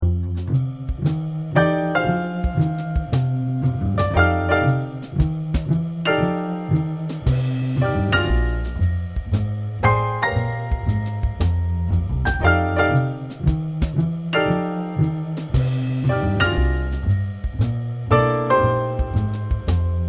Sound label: fast blues in f